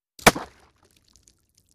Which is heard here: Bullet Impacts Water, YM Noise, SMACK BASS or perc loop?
Bullet Impacts Water